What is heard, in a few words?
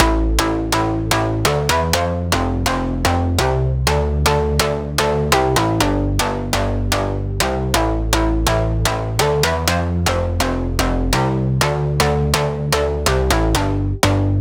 Drop Bassline A 124 Dry